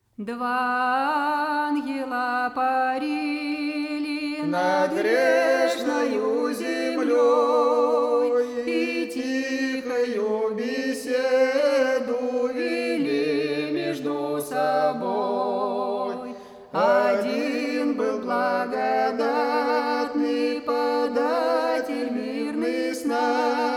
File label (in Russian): Духовный стих; Волгоград; Старина; Два ангела парили